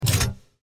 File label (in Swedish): Prison Spak